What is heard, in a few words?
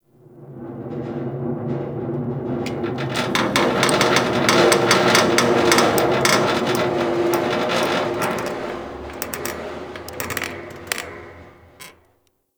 Ship High Metal Creak; Warframe; Corpus Ship Remaster